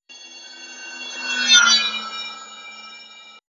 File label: passby pressure total